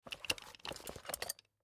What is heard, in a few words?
Toolbelt Insert, Toolbelt Insert Tool; Hand Tools; Blastwave